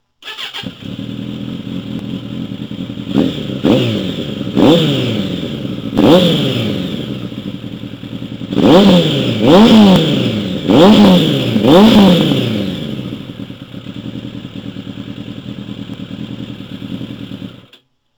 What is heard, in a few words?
Bandit600 endcan mod